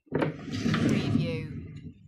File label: Open Drawer; Household Sounds / Doors, Windows & Locks